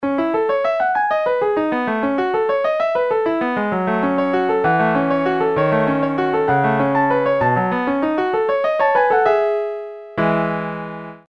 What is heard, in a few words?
PIANO